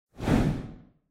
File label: Camera Motion, Low; シーン・カメラモーション